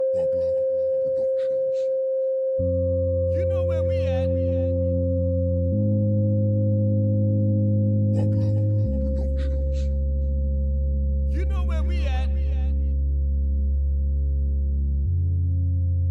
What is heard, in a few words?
Reggae Dancehall